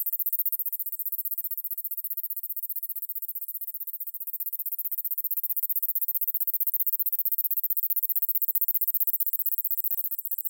stubby red puer; VI

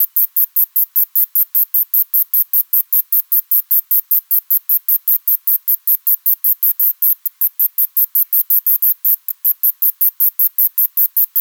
Myopsalta platyptera Brigalow Research Station

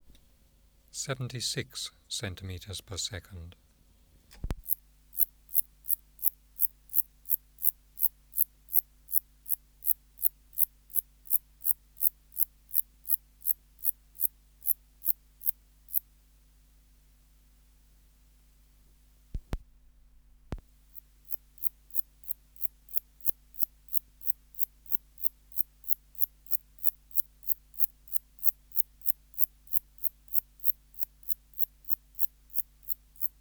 Metrioptera abbreviata

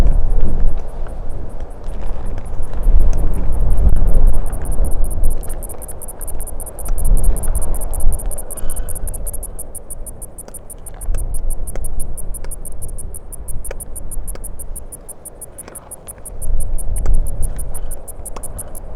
Clinopsalta tigris

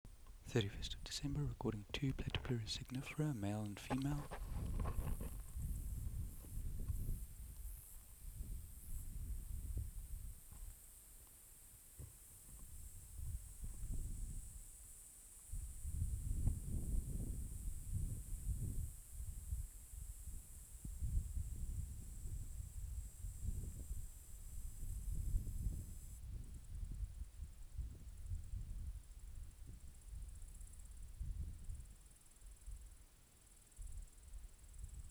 P.signifera nr Groenriviermond

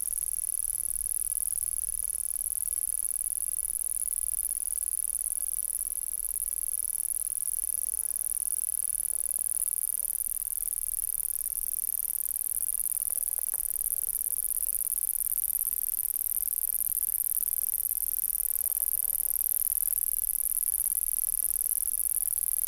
spinifex rattler

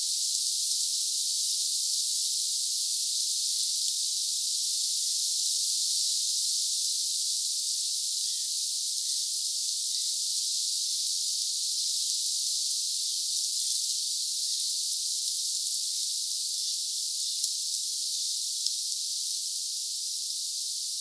Myopsalta leona; 4km W Binjour

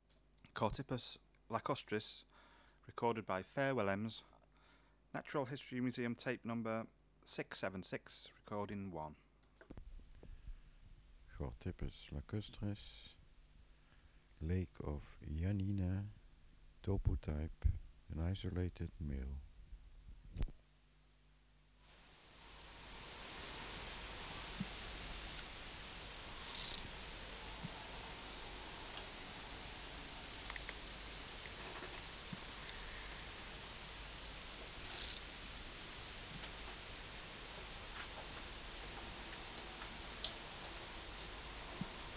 Chorthippus lacustris

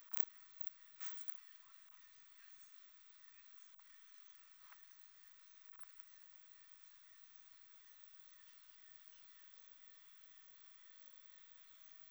Rhinolophus trifoliatus 20kHz HP

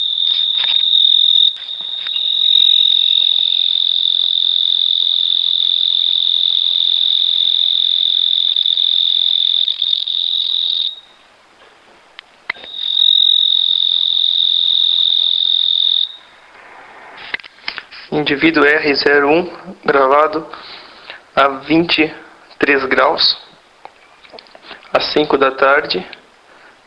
Oecanthus pictus

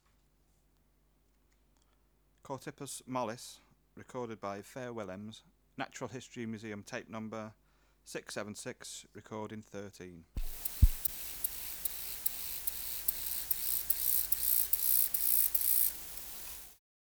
Chorthippus mollis

